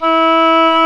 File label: WINDS & BRASS